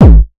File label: 909 dist, kicks; drum-machines; deepsky